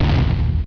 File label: beep; Midway Games; Smash TV